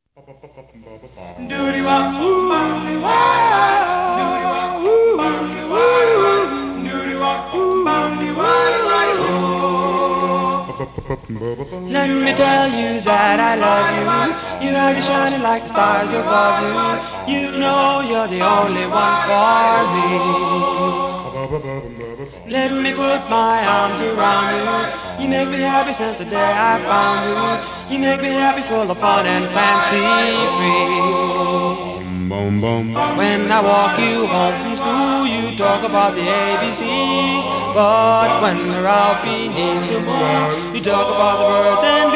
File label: acappella